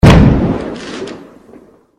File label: Explosion; Granny 3; Android